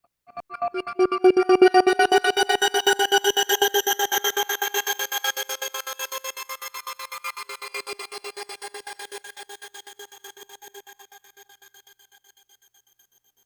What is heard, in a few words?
Gated Ambience; Electronic Point Blank